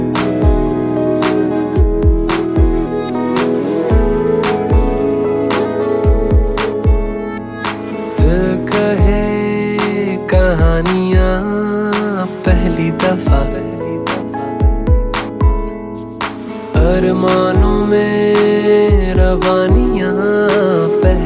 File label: bollywood music ringtone